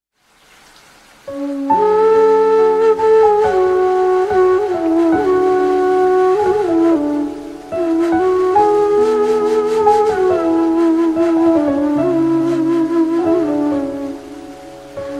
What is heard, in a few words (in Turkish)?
enstrumantal fon muzikleri